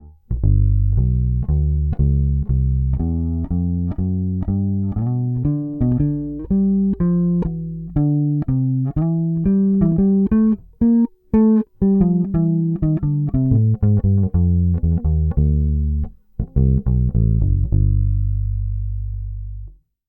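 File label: Fender Precision dry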